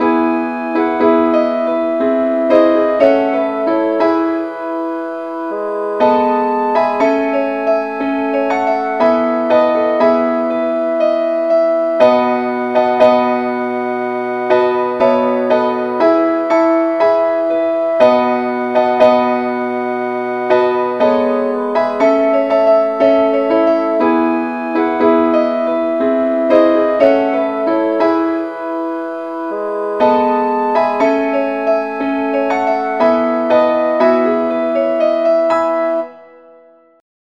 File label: keyboard key A